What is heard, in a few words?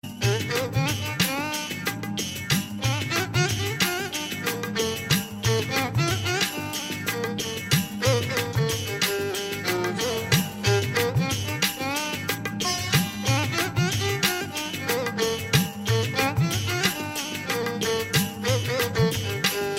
Violin